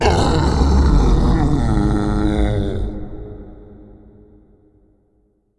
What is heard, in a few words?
supermutant behemoth death